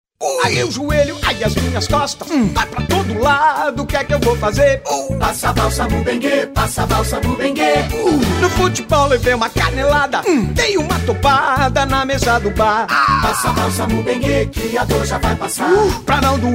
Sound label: BENGUE JINGLE; BALSAMO BENGUE; REGIAO SUDESTE